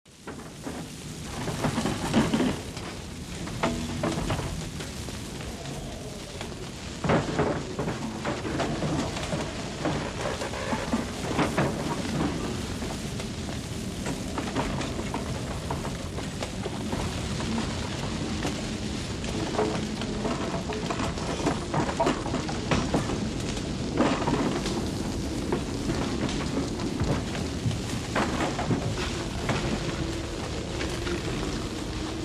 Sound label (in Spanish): tormenta con ruidos